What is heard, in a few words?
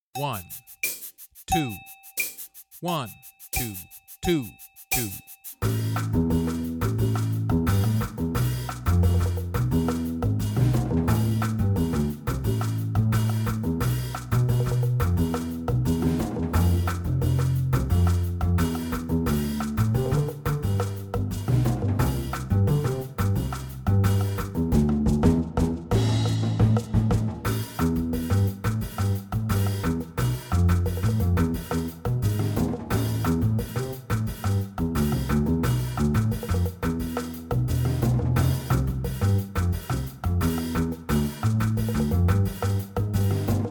Piano Play a Long LATIN; Jazz-Band; All-State